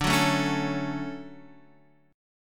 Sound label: D-Major 7th D down Guitar Half Step Down